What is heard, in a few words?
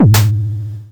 Electron Sidstation, Drum Machines